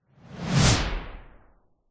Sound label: sound design transition whoosh med fast; zapsplat